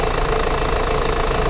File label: ford focus, Vehicles